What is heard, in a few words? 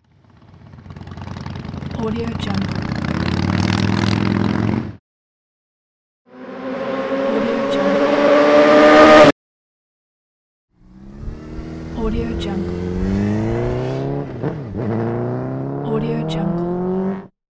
motorcycle sound